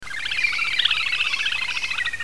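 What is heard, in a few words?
little grebe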